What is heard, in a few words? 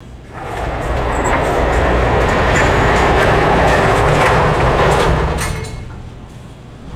heavy mechanical roller passing by and stopping